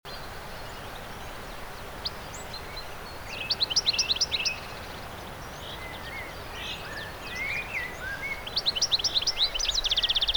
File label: Windy Meadow Birdsong Loop; FxProSound; Envato Elements